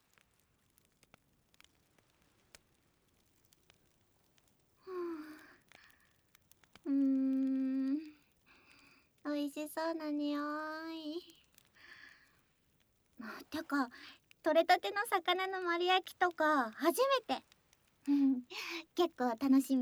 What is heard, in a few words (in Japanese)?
焚き火の前で耳舐め; ASMR; 魚を焼いている間に～焚き火の前で耳舐め手コキ♪～